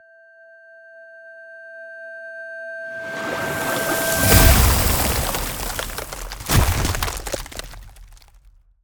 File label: Ice Spell Power Up Strength Boost; Arctic Aura